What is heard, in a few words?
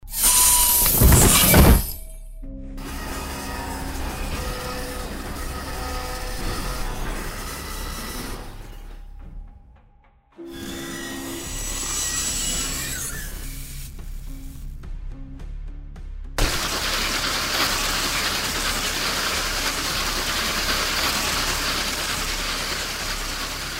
Robotic Movement; machine sound fx